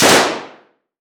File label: Weapon Gun Machine Gun Thompson Multiple Shots Interior Shooting Range Short; AdobeCC